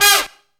SMOKE HIT; Zero-G; Funky Elements

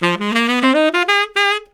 SAX LICK, BRASS LICKS; Ueberschall; Jam Box

